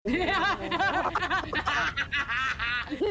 laughter

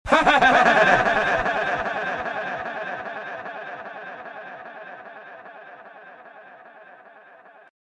super cat laugh

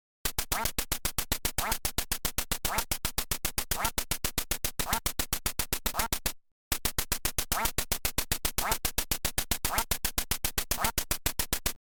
duck flying

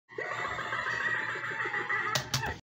laugh; green thunder

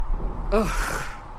ugh

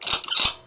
gun load

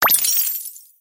gem collect

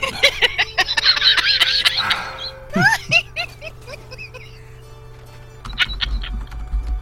cackle

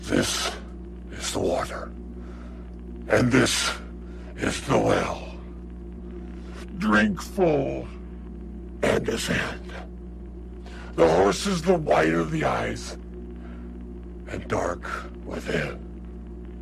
chant; woodsman

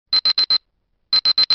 tip sound small

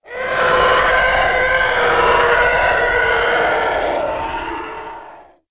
flyby; tie

